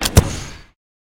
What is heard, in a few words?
spin button click; football